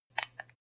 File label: landline click muted